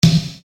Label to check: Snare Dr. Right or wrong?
Right